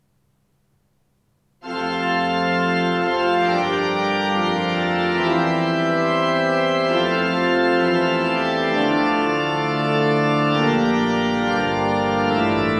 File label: Fanfare; Avaldsnes kirke